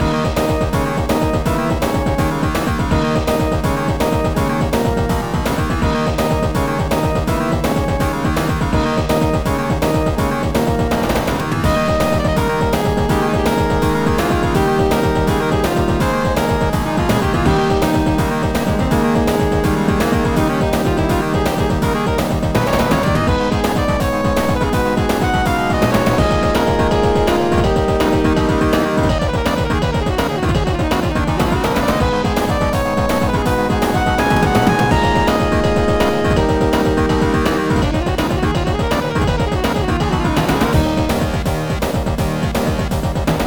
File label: Castle Boss Loop; ravva and the phantom library